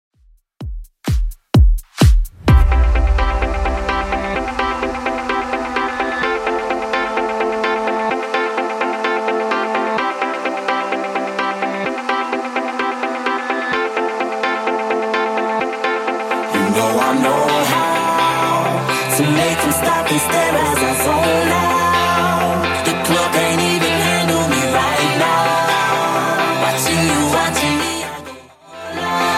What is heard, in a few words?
128bpm